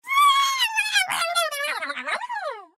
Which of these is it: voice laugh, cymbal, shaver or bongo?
voice laugh